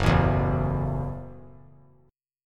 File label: Gb Minor 7th; strummed; JGuitar